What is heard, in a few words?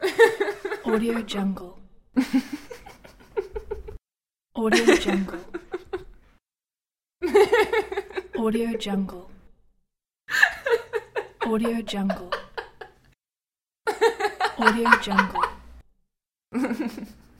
female giggles